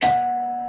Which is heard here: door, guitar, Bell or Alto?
Bell